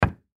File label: Door Glass Knock; Wood Frame Single Knock; Office; Blastwave